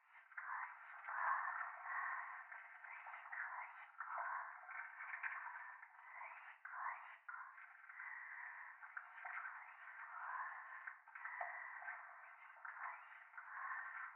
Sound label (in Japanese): トイレ しこしこ 触手